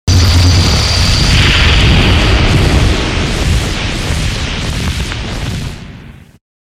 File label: Fire element spell